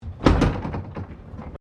Tram Cable 1800s Carriage Door Open; Portland; Portland Cable Tramcar; Streetcars; Vehicles

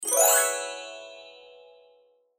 Harp Wind Chimes, Harp Wind Chimes Ascend Fast; Strings; Blastwave

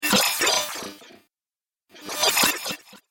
Dubstep Scifi Effects

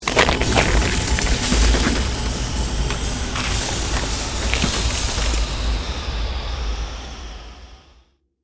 CREATED MAGIC SPELL BLIZZARD SHARDS

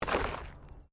Body movement Slide Gravel; Body movement foley to simulate falls and deaths; Bodies; Human; SoundEffects-Foundation1

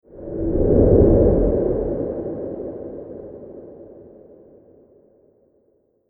Meteor Single Whoosh

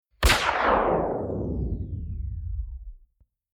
Gun Rifle Shot Slowdown Decreases Pitch; Sound Design; Stinger; Stingers; Tom Hutchings

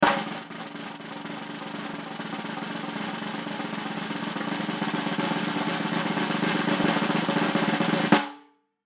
Drum Snare; Snare 14 Inch Pacific Drum Roll Long; Drum Kit Sound Effects

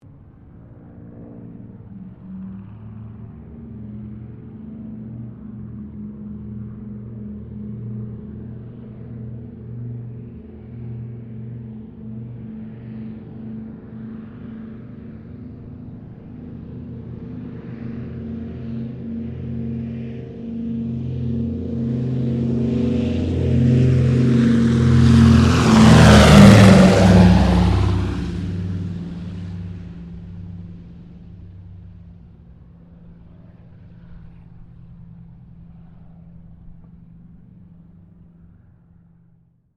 AIRCRAFT DOUGLAS DC3 TAKEOFF; Qantas 1942 DC3; Airplanes; Vehicles

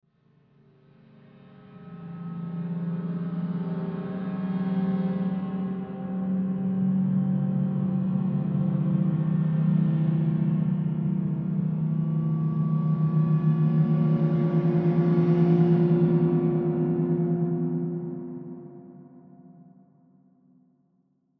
Horror Cymbal Swell Low; Scary Tones; Blastwave

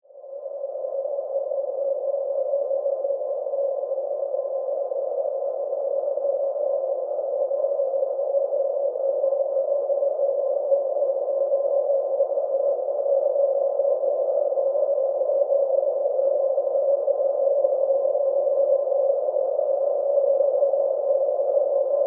Drone Wind Howl, Desert; Production Element; Drones; BlastWave

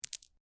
Marble Impact With Several Marbles; Small rattle of impact from marbles or pebbles.; Miscellaneous Toy Sound Effects

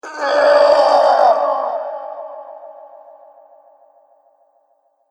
Horror Roar, monster

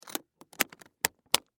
Foley, Case, Latch, Close; Latches (Sound Effects:Objects)

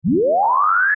ASCEND SQUARE WAVE, Distortion, Ascend, Descend; Ascending; Multimedia; Production Element, Imaging Element, Accent, Transition

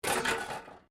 METAL SCRAPS MOVE

